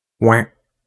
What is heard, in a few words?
Quak; FatJohnsLifter; SFX